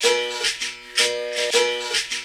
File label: LOOP L, Drum & Bass 160 BPM